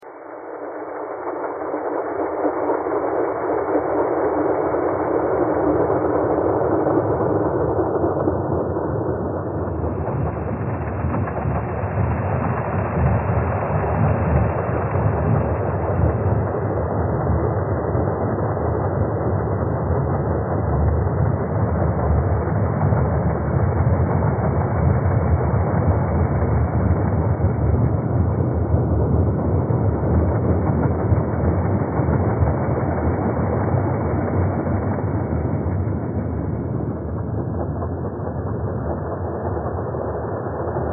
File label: thread harmonic